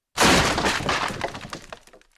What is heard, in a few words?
breaking wood; شکستن چوب